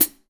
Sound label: OHH, REAL DRUMS, SWING KIT; Best Service ProSamples vol.43; Real Drum Kits